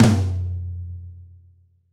Rock Flam Tom, Roland BK-5

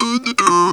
"Ououou ou", Funky Vocals; USB Soundscan vol.18; TB MELODY

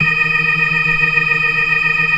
FAST VIB, ORGAN, TREMOLO VIB

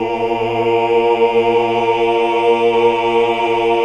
OOH, Hallelujah

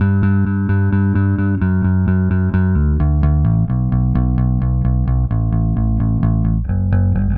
Bass, Indie Rock; Sonic Foundry (Sony Creative Software); Indie Rock Anthems; Session 10 - Roswell

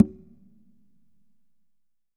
Congas wet, drums percussion

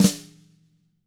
WFL FLAM, WFL SNR; ILIO; Double Platinum Drums 2

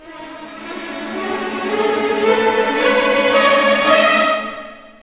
OPEN; East West; Scoring Tools; ORC; Open Theme

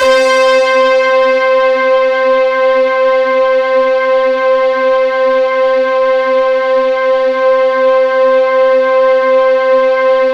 C4, synth; 90 s Sample CDs; NiceBeats; SynthLine VOL-27

